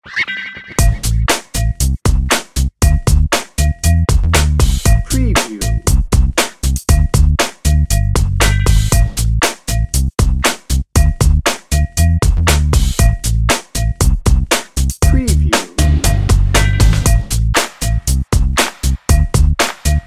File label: slick chick